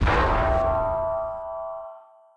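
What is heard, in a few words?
Rivet Hit Exterior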